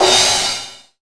TRASH CYM; Zero-G; Funky Elements

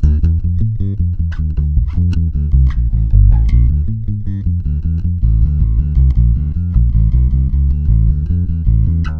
BLUES I, 156 BPM, Bass; Sampleheads; Ultra Freakin' Bass

